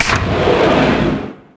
Elevator Doors Move; Max Payne

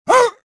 gasp

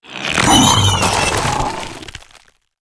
kinrath dead; Star Wars Knights Of The Old Republic I; XBOX

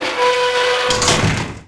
door iron gate close; doors elevators; Star Wars Obi-Wan; XBOX

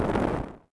character Aflame end

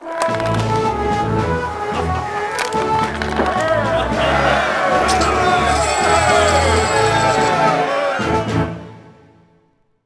find treasure; Sid Meiers Pirates; XBOX

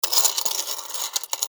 cashout, slots

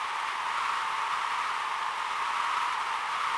wind; Quantum Redshift

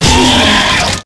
Rap Attack, Dino, Raptor

